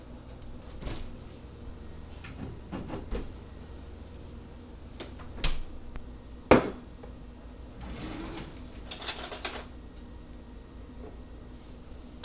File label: Beer, binaural